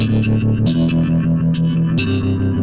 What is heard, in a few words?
tremelo lower loop